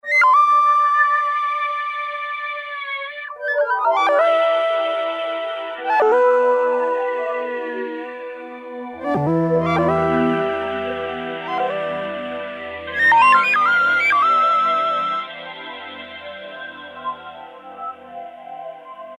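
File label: E-mu Morpheus, Lead; Whofs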